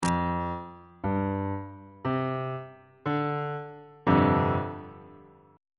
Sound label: f double sharp dominant 7th suspended 4th 3rd inversion on bass clef